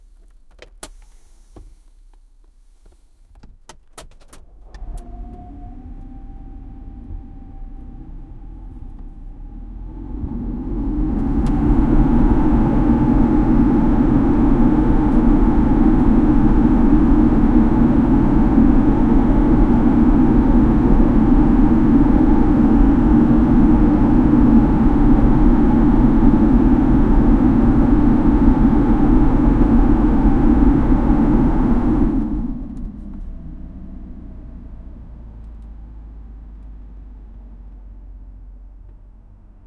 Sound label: honda accord with a, car; 1996